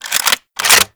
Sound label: Pistol Reload, Weapons; Warframe; Grineer; Kohm